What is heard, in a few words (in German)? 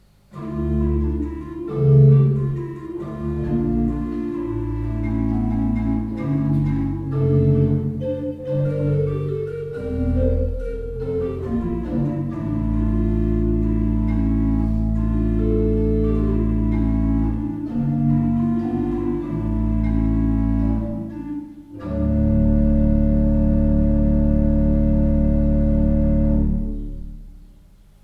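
Orgel, pfeifenorgeln; Seifert Kevelaer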